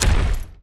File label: salamander move; Akeron